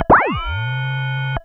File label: Serge Saucer, Serge Modular drums